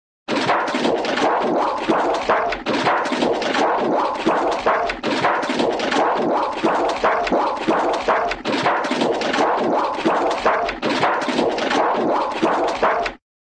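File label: washing machine; cartoon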